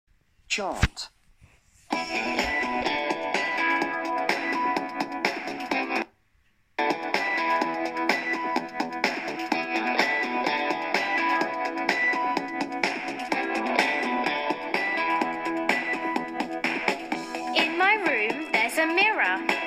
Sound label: chant; My bedroom